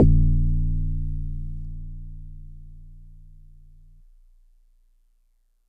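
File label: Marimba DX100 A#3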